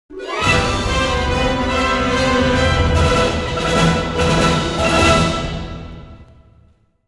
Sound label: Ramp Down, music